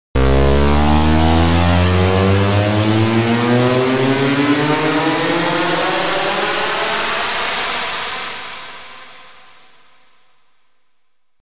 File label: Riser Sweep